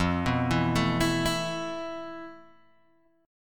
F Major 7th Flat 5th, Guitar Open G; down; chord shape

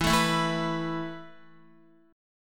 E Suspended 4th E down Guitar; Esus4 strummed; JGuitar

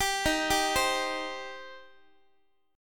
D sharp 6th, Guitar; arpeggiated; D#6; x,x,10,13,11,11; JGuitar

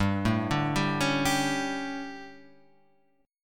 D Major 7th Suspended 4th, chord

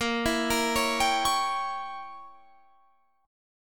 A sharp Diminished 7th Guitar Standard; 18,19,20,18,20,21; arpeggiated; JGuitar

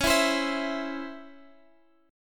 Db Minor Major 7th Db Guitar Standard; DbmM7 strummed; JGuitar

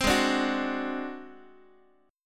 G sharp 7th Flat 5th G sharp down Guitar, chord; G#7b5; x,x,3,6,6,5; strummed

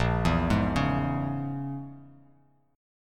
A sharp Minor 7th Flat 5th A sharp; arpeggiated; 6,7,6,6

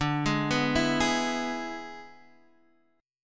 E-Major D

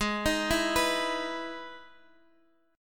E Major 7th G sharp

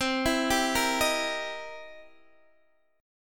C 7th Sharp 9th, Guitar, Open D

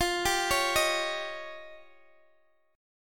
F 7th Suspended 2nd Sharp 5th, Guitar, Open D

